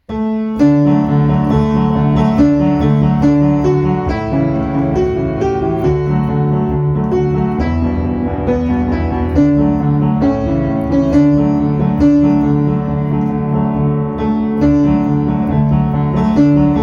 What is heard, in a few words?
Instrumental